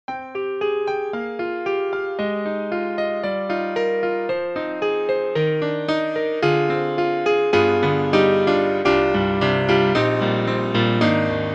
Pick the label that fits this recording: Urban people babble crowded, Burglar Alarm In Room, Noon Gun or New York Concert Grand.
New York Concert Grand